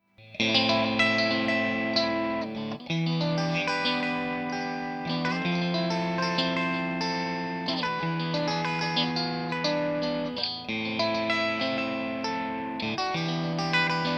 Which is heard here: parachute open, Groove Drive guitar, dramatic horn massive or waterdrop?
Groove Drive guitar